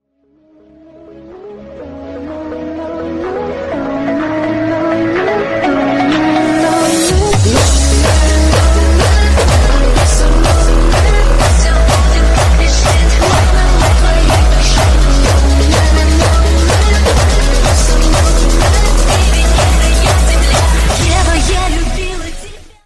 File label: hardstyle